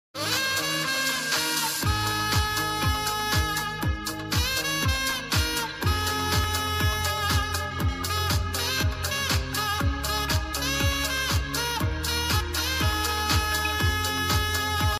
saxophone bgm ringtone; viral shorts ringtone

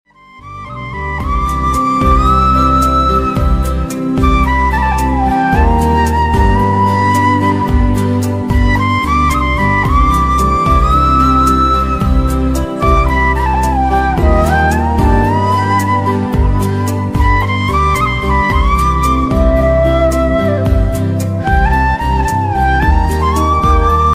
flute ringtone flute bansuri ringtone

